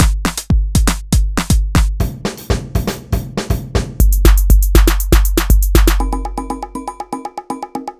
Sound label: Groove Pizza 120bpm